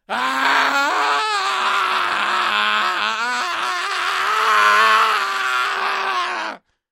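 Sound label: sounds with the scream of a burning person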